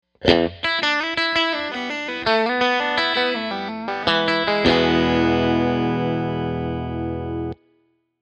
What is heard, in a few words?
Bridge Pickup Clean